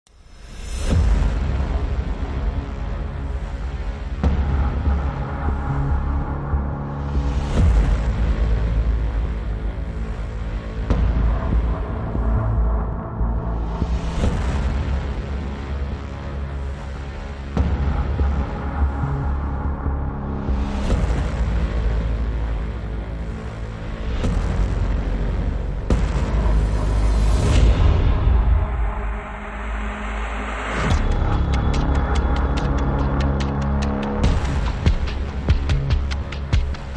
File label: Drums Bass and SFX Only